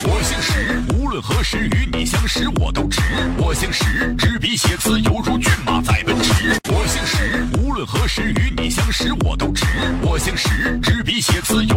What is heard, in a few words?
chinese techno bump